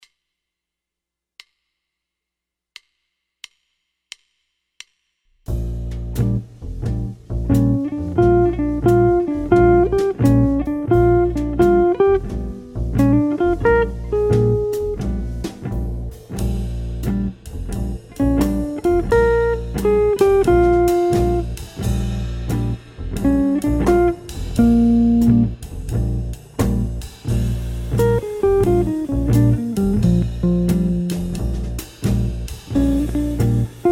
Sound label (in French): Playback, guitare jazz